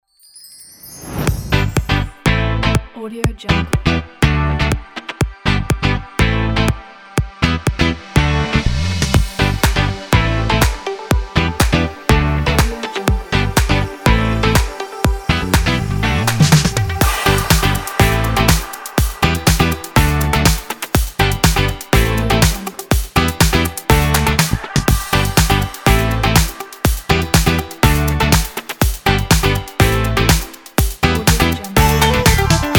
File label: happy summer pop dance